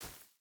footstep barefoot grass run; Rust

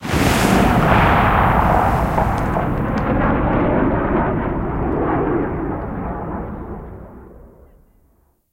rocket tail; guns; tanks; War Thunder

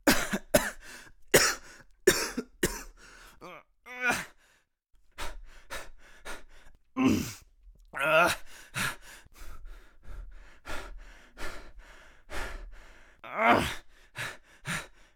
breath death; Escape from Tarkov; usec2

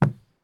run wood; Escape from Tarkov

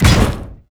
biomech walk soft

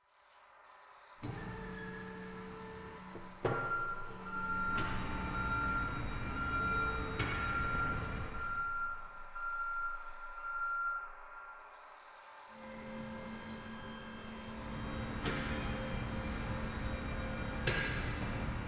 ambient port; World Of Warships; clanbase

